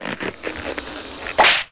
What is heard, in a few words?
glove